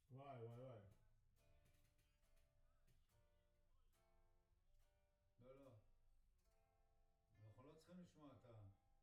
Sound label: Piano B